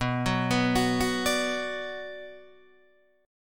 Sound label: B Minor B Guitar Open D